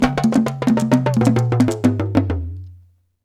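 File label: 132 bpm, Ju-Ju Talking Drum Lead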